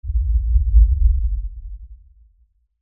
Lfe Rumble By Swell Low Bass Movement; Rumbles; Blastwave; Sound Effects:Sound Design

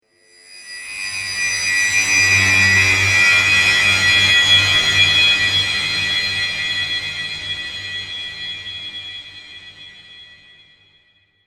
Sharp Metal Screech Horror Transition

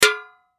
Impact Paint Can Hit Empty; Sound Effects:Objects; Blastwave

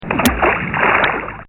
Water plotch; Nature

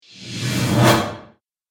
Cinematic Reverse Whoosh; Whoosh Sound Effects

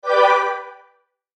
Sweeper Swell Tonal Fast, Sweeper Fly By Fast; Production Element Imaging Element Accent Transition; Sweeper Sound Effects

